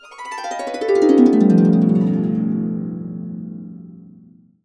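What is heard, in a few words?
B3, Complete Harps; USB Soundscan vol.62; AKAI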